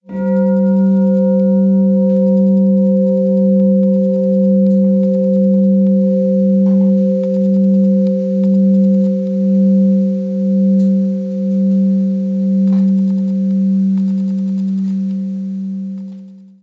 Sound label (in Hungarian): kinagong